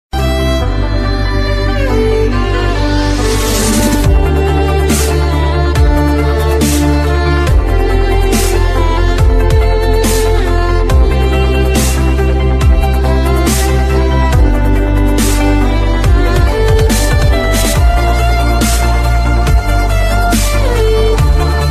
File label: Techno Violin